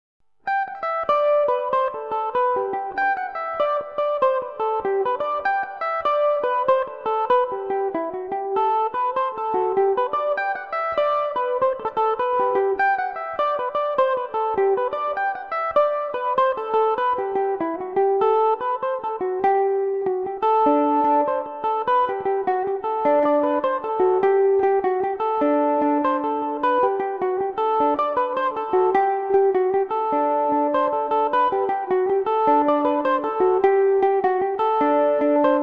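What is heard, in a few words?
G major revelation, Irish mandolin; bring back the child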